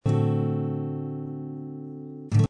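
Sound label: acoustic guitar chord D7; Lounge & Reggae; Loopology; Be Bop Baby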